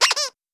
squeak, toys

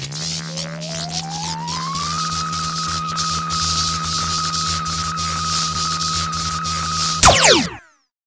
beam start; activities blackdwarf

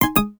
volume up; Cosmic Rage

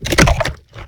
flesh; combat; snap

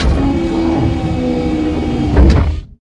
cargo net lower, ships

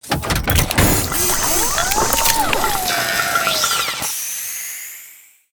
warp fuel, ships

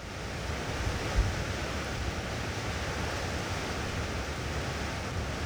harsh wind, weather; CosmicRage; CosmicRageSounds

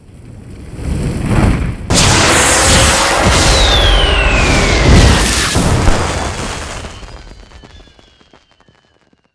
dragon, firework

